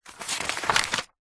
household book wordsearch magazine page turn fast hard; zapsplat